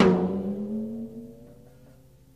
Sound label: tom sound f sharp key, drum single hits one shots